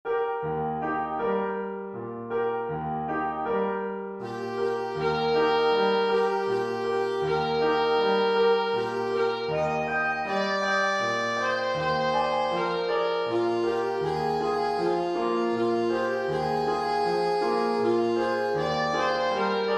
brahms lullaby alto sax

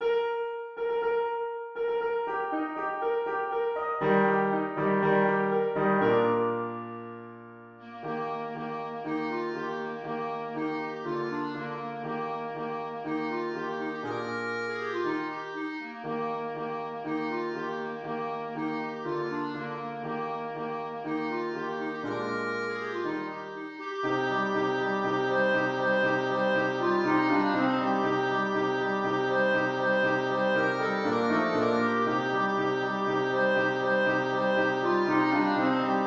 william tell clarinet

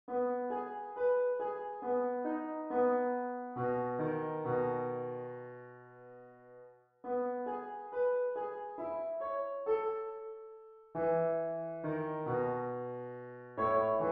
willow creek piano

